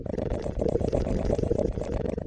insect; quake2; mnhcoop

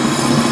fly; quake2; coop; monsters; bossz

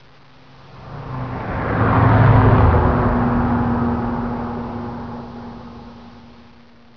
car pass; quake2; mnhcoop

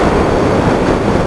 train; quake2; mnhcoop

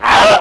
soldier pain; quake2

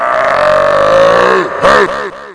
pain; quake2; tourney; Hueteotl

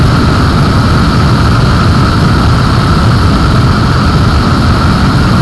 fire loop; quake2; coop

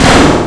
blast, weapons; quake2; railz

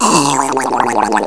gurp; quake2; redux

